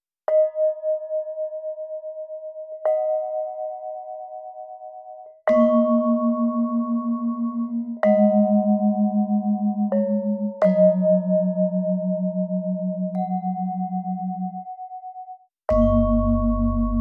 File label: Groovy Vibes Balafon Solo